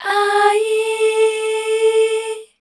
MEL A L, Voice Spectral; Best Service; Voice Spectral II

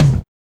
HSE-TM; E-Lab; X Static Goldmine 1

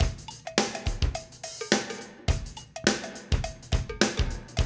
BREAK

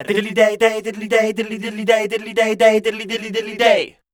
scat, voice

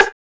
rim

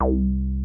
TB303

